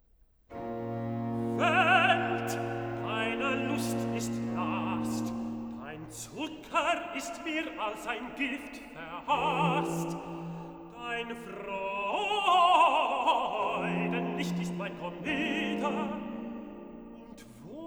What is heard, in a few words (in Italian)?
Cantata, Recitativo (Tenor); BWV 161 'Komm, du süße Todesstunde'; II. Recitativo (Tenor) 'Welt, deine Lust ist Last'; Bach; 16th Sun After Trinity